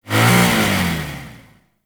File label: Infested Hammer Rev, Weapons